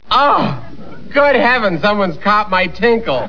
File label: laugh